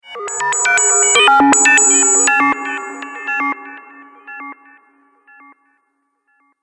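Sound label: Science fiction Data Bleeps Alien Contact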